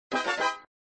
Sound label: Fanfare; flipper